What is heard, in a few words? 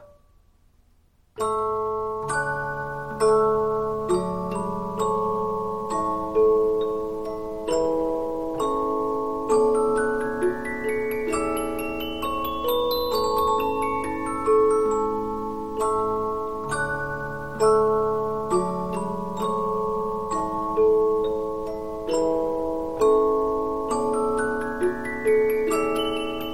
Polyphon, 15inch disc musicbox